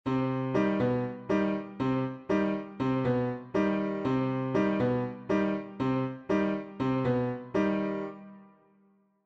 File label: montuno